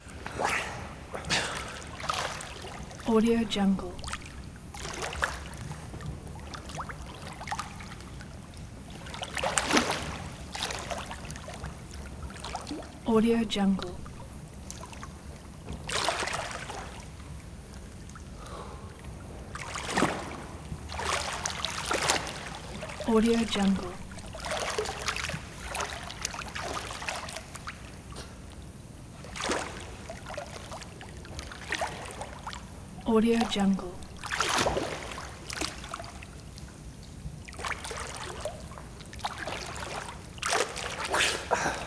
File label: sports swimming swimmers treading water breaths